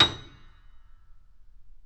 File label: Mf A#6 off, Grand Piano off mic; AKAI S6000 CD-ROM - Volume 2